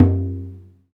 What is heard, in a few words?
MalaiyuDrm